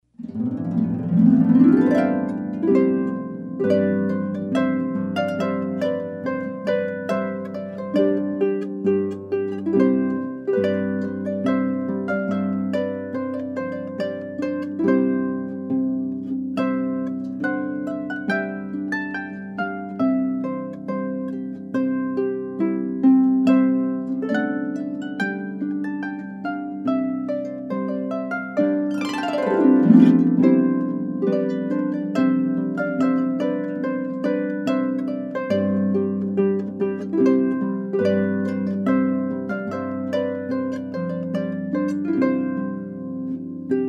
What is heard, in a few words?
Trumpet Voluntary